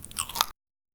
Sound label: chewing sound, eating